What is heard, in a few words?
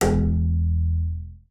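bass col legno E1; Xsample VOL-5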